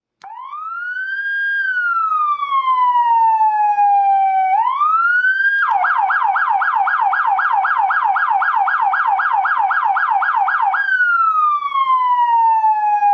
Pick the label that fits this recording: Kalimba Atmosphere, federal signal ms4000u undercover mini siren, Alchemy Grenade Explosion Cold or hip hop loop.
federal signal ms4000u undercover mini siren